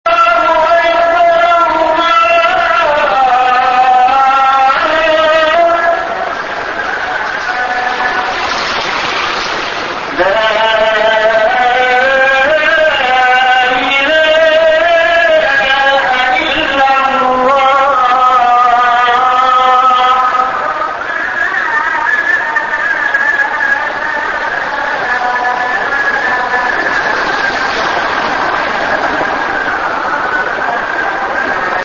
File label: azan, stambul